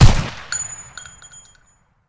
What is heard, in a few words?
stoner 63 fire, weapons